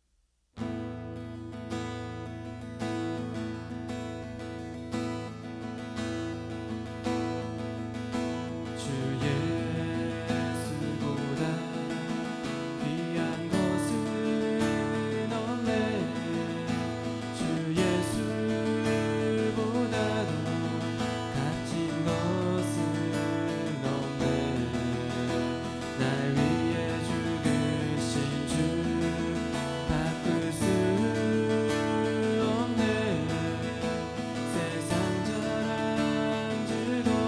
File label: worship guitar